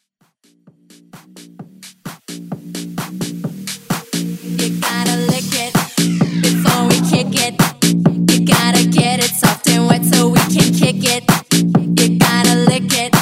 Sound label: Word Play; Word Play Edit